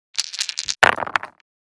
Casino table dice being shaken and thrown dice rolling